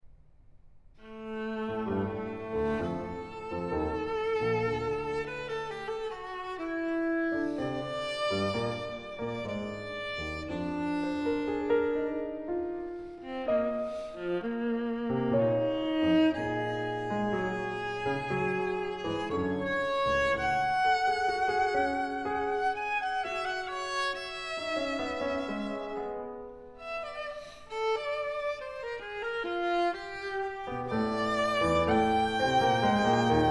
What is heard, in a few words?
sonata for viola and piano adagio